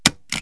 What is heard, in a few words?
stapler; KABOOM! - Wild and Wacky Sound Software; office; desk